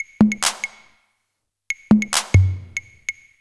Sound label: Snare Loop, Drums, Dance Beats